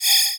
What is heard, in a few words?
Multimedia Internet CD-Rom Flash Whoosh Electronic